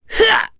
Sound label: vox Jump; Ezekiel; kingsraid; voices heroes